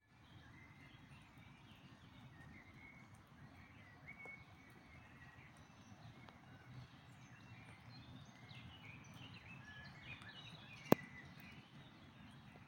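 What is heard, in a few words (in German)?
Dawn Chorus, Vogelgesänge vor Sonnenaufgang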